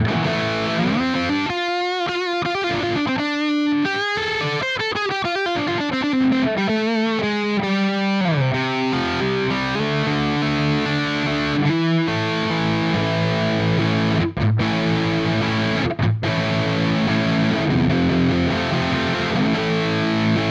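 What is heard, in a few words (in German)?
Hagstrom Ultra Max Special Bridge Zerre, guitar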